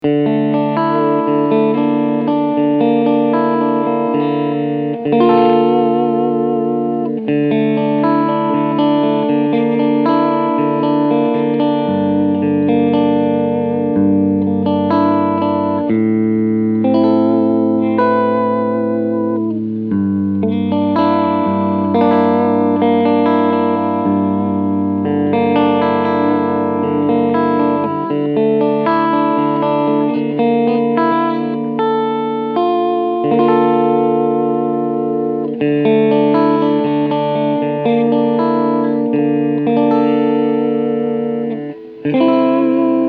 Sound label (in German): Beide PUs Clean Picking